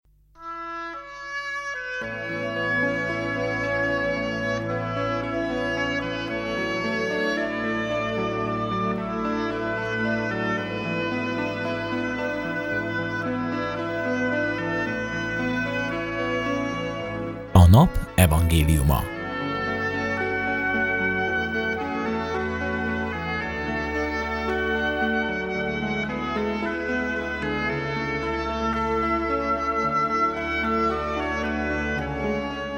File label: Jingle; Nap Evangeliuma; nagybojtben; STUDIO GYULAFEHERVAR